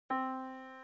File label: yamaha Disk